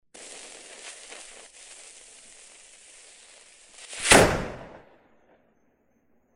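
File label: fizzing bath bomb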